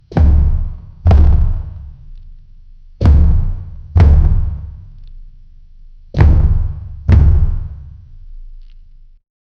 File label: old elephant produces a; animals